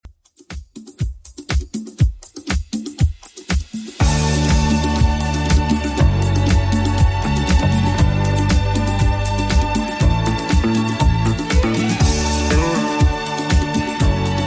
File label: 70s Redrum, 120 bpm